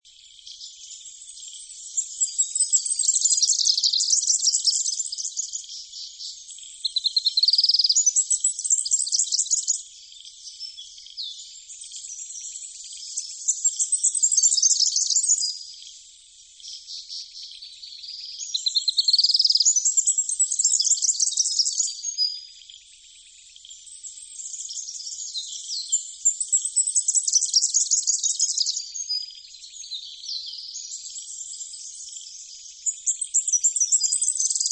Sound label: Fairy Wren